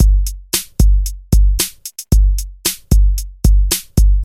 113 bpm breakbeat g key, Free drum loop sample - kick tuned to the G note. Loudest frequency: 1570Hz